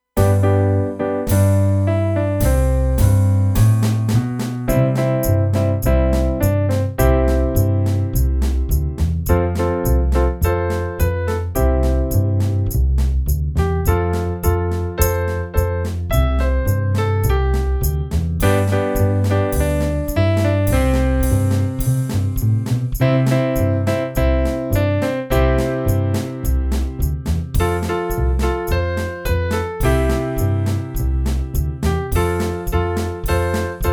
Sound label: Karaoke Version